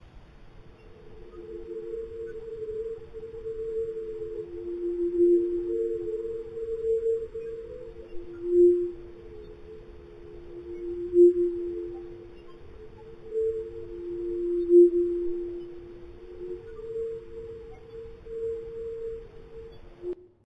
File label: Signal